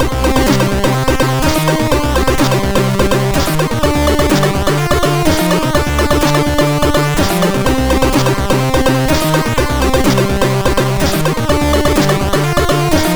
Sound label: intro, sid MOS6581R4; Attitude 15